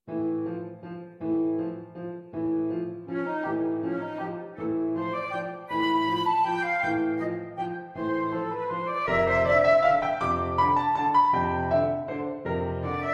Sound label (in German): Komischer Walzer